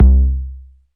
[ Sub ]

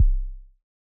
Kicks

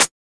Closed Hats

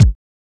kicks

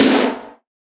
snare 80s

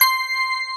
Phat Synth; PhatSynth (89)

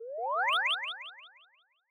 Riser

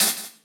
hihat closed; Closed Hats

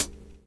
Percs

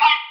Perc

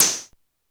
Open Hats

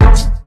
Hit

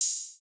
OH, Open Hats

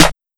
Clap

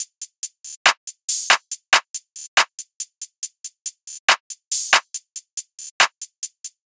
Top Drum Loop 140 BPM; Cymatics - Astro Butterfly

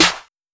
Snare

